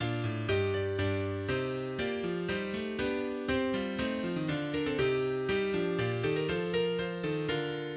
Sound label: reduce tenor density